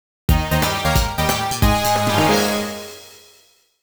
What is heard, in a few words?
Level Complete; Garfield's Nightmare; Nintendo DS